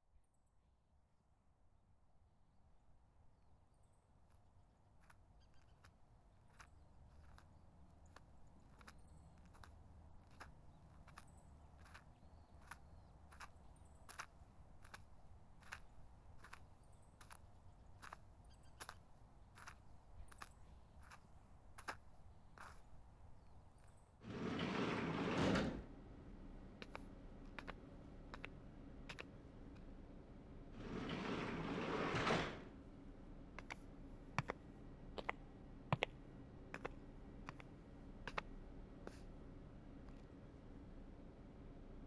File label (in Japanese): 団子を焼く音; 仙岱名物『ぴんこ団子』